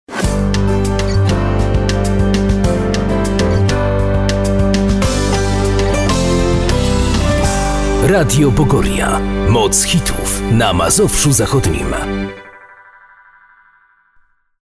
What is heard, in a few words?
Pop Long